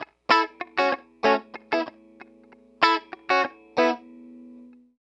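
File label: Chill Pop Funky Guitar, Emaj, 095 bpm; Pop Rock; Chill Electric Guitar; Pro Tools Essential Loops; Digidesign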